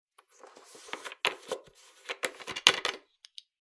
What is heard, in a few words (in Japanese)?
ペットボトルのキャップを外す音